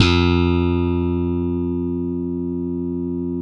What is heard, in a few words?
POP BASS, Electric Basses